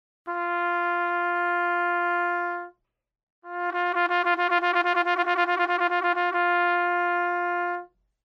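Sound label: Tremolo, trumpet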